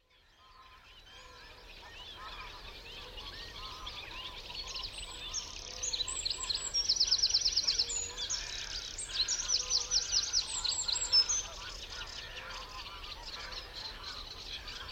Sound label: dawn chorus wren and bittern